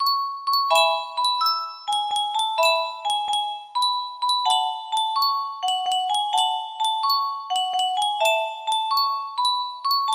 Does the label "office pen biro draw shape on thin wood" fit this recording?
No